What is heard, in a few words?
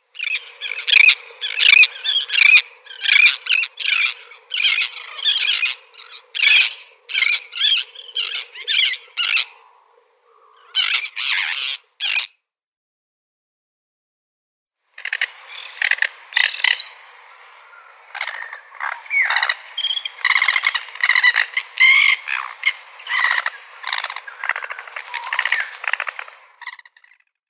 Lesser Crested Tern